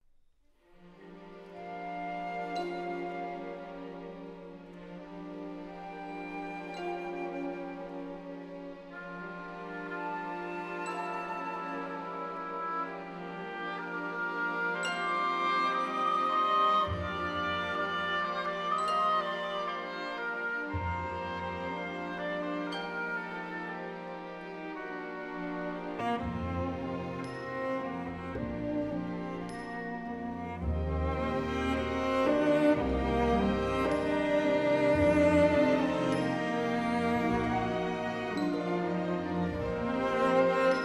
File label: Chants d'Auvergne Berceuse Arr for Cello and Orchestra